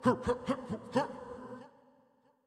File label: Toxic Mask Fire Jutsu